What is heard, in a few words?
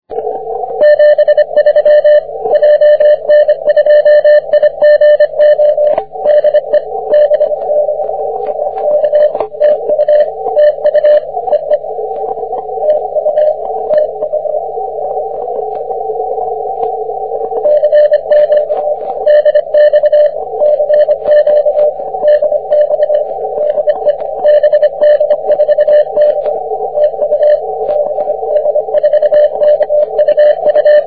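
40m continuous wave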